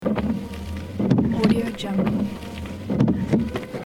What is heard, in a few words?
car windscreen wipers loop